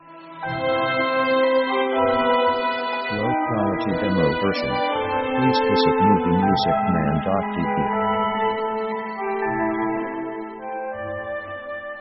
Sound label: orc dream walz; orchestra themes